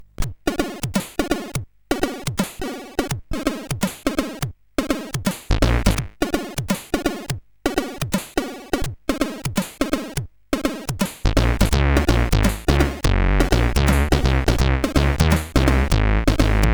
Happy Birthday, sid CSG8580R5; Happy Birthday Ecco

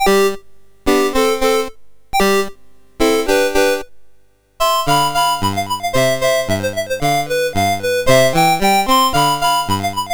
Pizzicato Polka, MOS6581R4

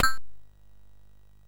SFX, MOS6581; Narco Police; MOS6581R3

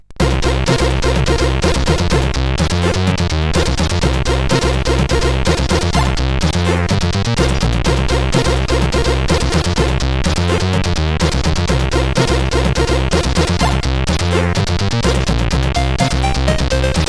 Triad, sid CSG8580R5

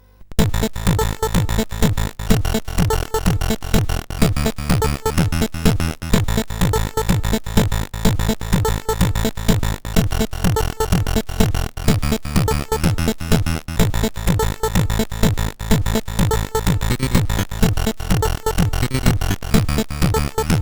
Tekkno, CSG8580R5

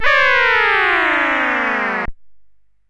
tape version CSG8580R5; sid

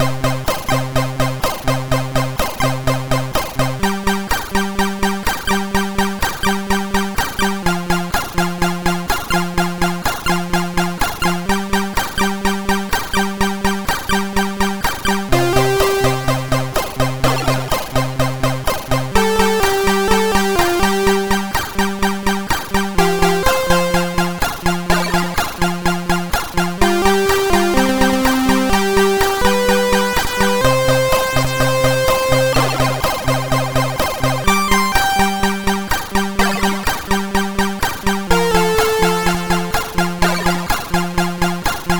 sid MOS6581R2; Nightflight

